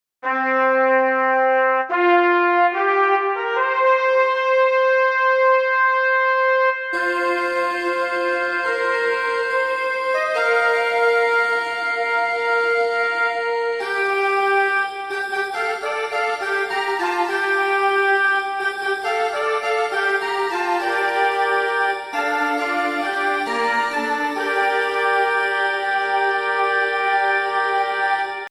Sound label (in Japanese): ファンファーレ; 東京オリンピック